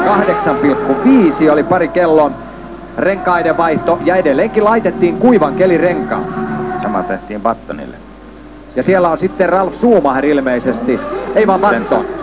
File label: button